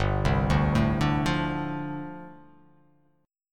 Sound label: G 7th Suspended 2nd Suspended 4th G; down